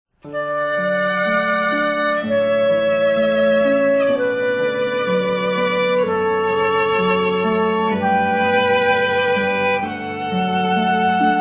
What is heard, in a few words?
Harp Violin Flute; Wedding Ceremony; Ceremony Musicians